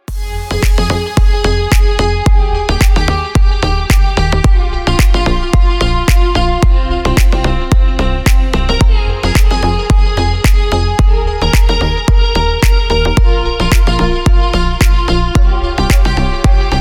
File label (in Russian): zvonkaja gromkaja narezka